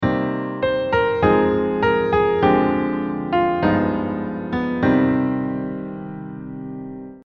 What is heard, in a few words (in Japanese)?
スケールで考えるメロディー